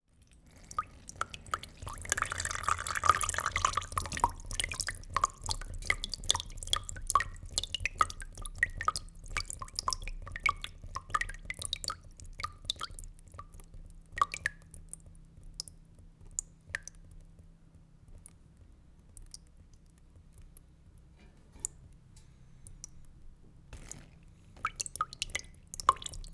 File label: Water Dripping Close Slow